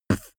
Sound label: beatbox bass drum damped